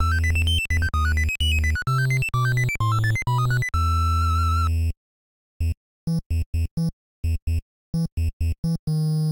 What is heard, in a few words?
music kingdom supply intro; skellboy linux macos switch windows gamerip 2020